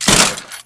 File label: plate drop; Fate Undiscovered Realms; ITEMS